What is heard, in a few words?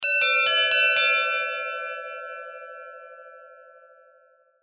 Doorbell Ring; Doorbell Chime Ring; Doors; Household; Doorbells; Blastwave